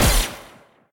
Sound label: Laser; Peace, Death; Fatecard